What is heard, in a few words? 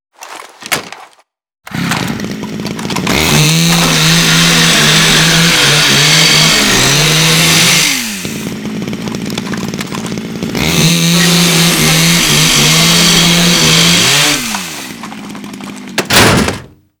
saw; Call of Duty Modern Warfare